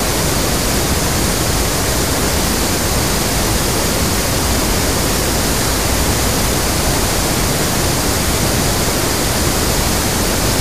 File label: Waterfall From Guri Dam, Venezuela